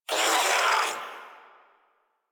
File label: Shadow Vocal Pain; Warframe; Duviri